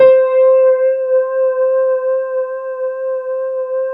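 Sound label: reverse piano C2; AKAI S-Series CD-ROM Sound Library VOL-2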